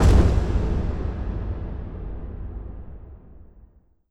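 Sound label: Entrance Door Open; Boss Rush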